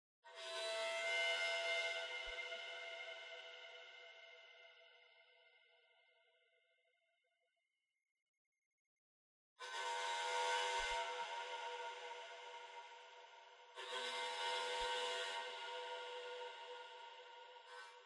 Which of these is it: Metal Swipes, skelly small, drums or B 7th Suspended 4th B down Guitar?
Metal Swipes